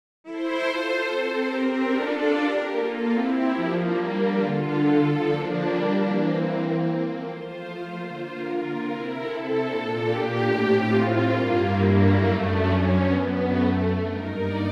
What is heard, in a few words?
whole Strings passage